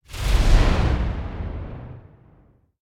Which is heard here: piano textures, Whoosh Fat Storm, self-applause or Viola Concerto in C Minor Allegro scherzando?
Whoosh Fat Storm